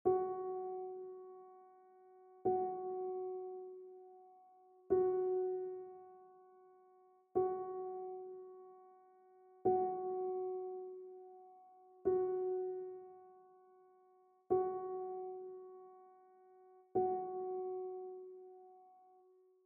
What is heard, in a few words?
PIANO ACCENT